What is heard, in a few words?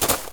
gravel land; firefight